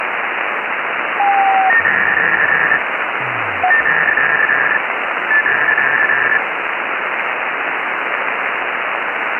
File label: weird telemetry